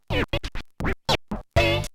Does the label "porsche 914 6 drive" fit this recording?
No